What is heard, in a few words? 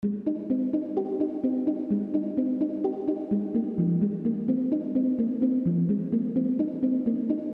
Bottle Arp E maj 28